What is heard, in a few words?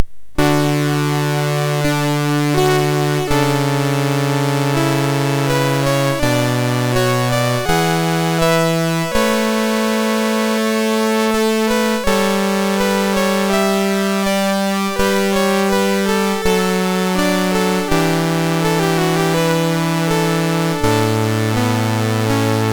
Anthem, MOS6581R2